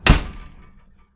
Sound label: Door Slam Shut, Oven Door; Cooking; Turkey Cooking Simulator